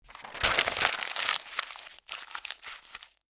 paper rustle

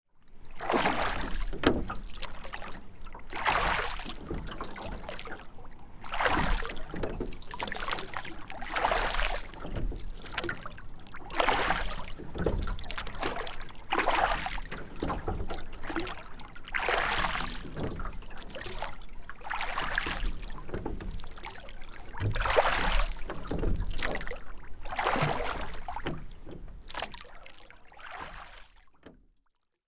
rowing boat rowboat; transportation

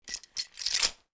belt, cloth

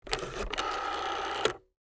rotary phone

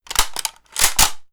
gun cocking; mechanical

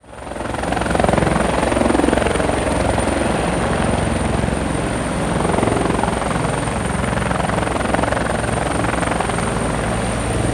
helicopter landing; transportation

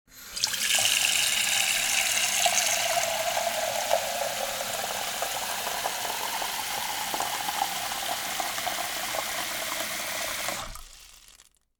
water pitcher fill, kitchen

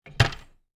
cabinet door close

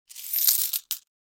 coins in hand

